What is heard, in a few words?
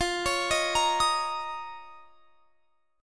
F 7th Suspended 4th Sharp 5th; arpeggiated